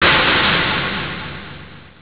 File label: burn